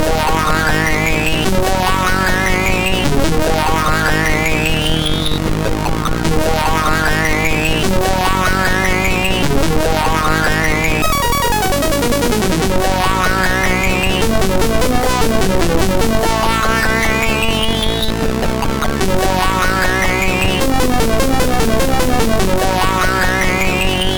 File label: Pure Alarm, MOS6581R4